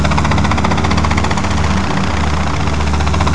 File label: heli loop; CD Action